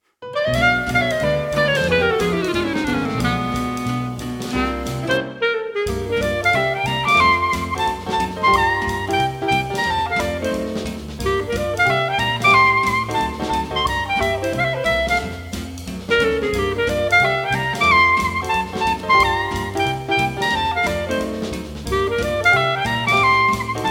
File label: Clarinet